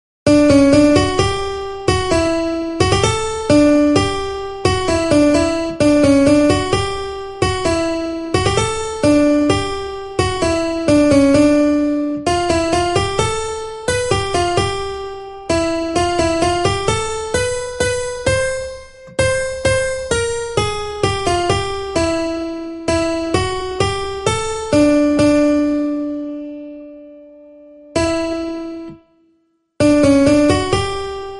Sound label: up tempo